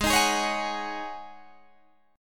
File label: G sharp 7th Suspended 4th G sharp, Guitar DADGAD